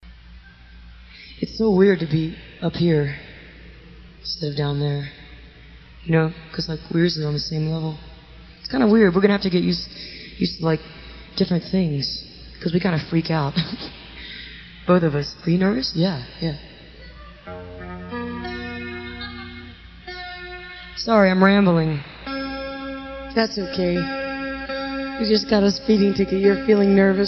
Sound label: crowd talk; speeding ticket 2